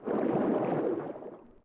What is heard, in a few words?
tread; DAoC; Toa; Gamestar